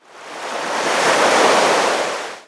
sea ocean hard; theodyssey

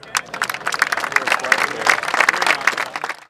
sport applause; theodyssey

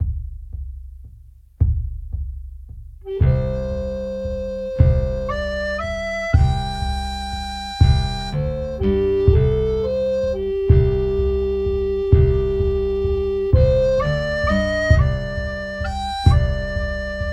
play sad song flogera; theodyssey

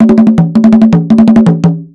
drums timbali; theodyssey

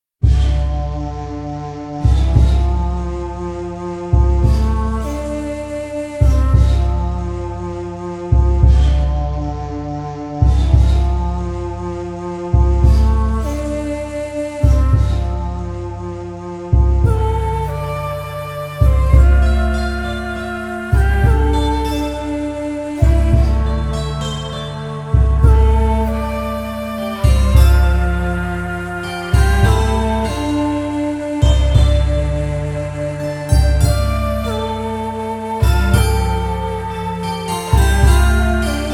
danger music; theodyssey

